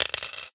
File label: money coin drop; sound effects one